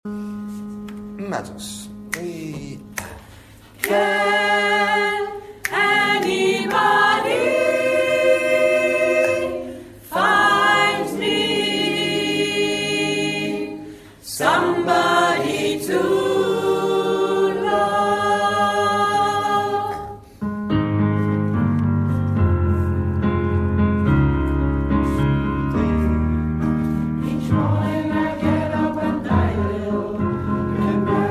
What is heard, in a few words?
mezzo